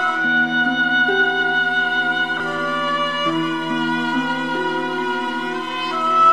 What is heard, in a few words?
sax forlorn, Sad; Loops